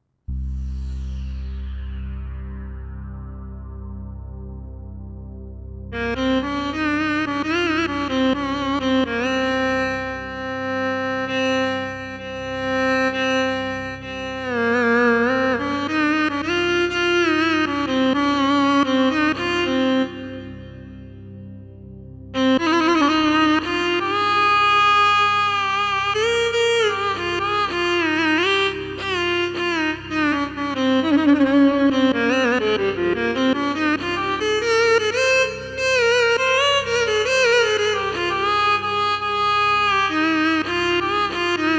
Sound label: SOLO KEMAN; usb turk demo